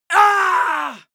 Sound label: male combat hit hard; soundpool